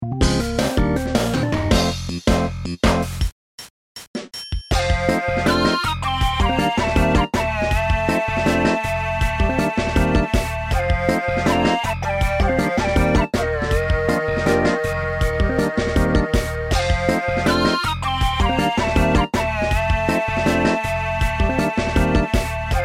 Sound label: Battle Victory; Rival